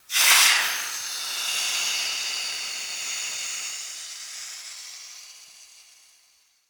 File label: Steam Sizzle Hit